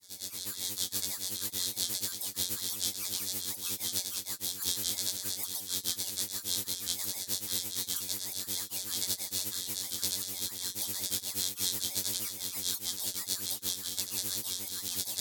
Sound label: EMF Designed UI Calculation, ElectroMagnetic Field; ElectroMagnetic Field Library; Spectravelers; Quantum